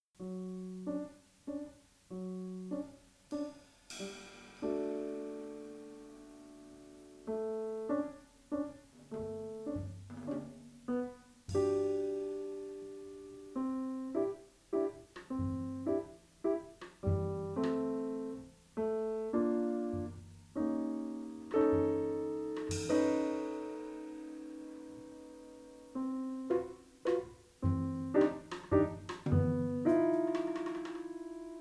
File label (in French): valse a cloche pied